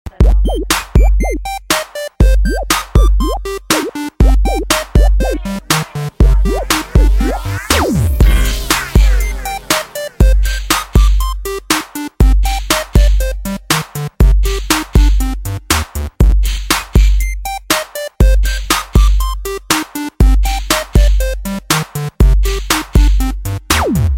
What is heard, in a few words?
Hip Hop Anthem